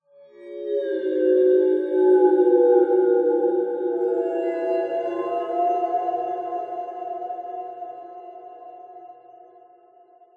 fantasy magic mysterious portal swell open with large reverb; zapsplat